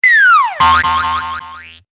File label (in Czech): pruzina